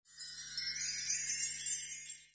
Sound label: chimes; xmas calender